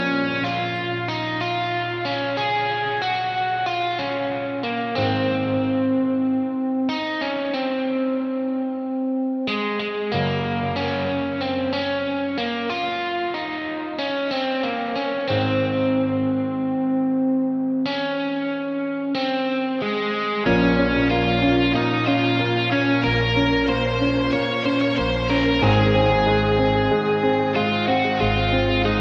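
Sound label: guitar music ringtone; gitarnaya muzyka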